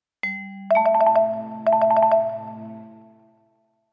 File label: Touch and Go, notifications; Ziox Zi4004